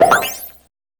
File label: bubblez lo, cartoon sci; Spectrasonic Distorted Reality 1